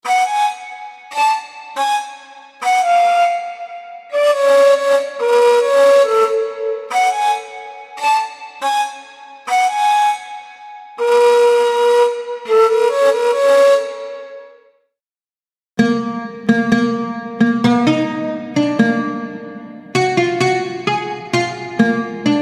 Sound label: Leads Ethnic; Unison; MIDI Wizard